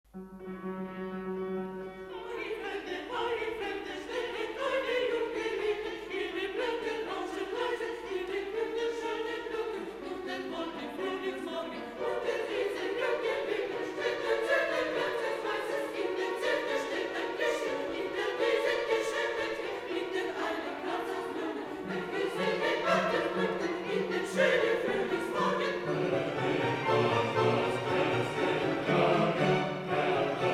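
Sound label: Choral songs orchestra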